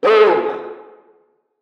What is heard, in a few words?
falloff